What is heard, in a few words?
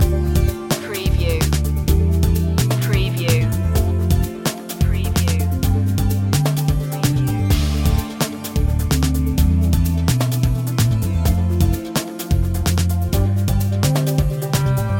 broken beats loop, chill